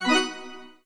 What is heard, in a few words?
healed